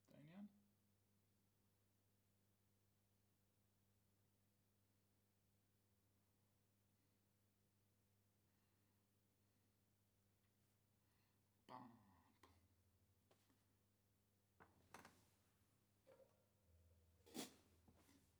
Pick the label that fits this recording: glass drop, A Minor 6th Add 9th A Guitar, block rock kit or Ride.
Ride